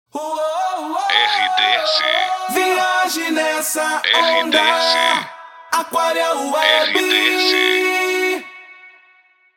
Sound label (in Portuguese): ACAPELA; Aquaria Web